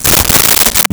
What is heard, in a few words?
Duct Tape Tear, Foley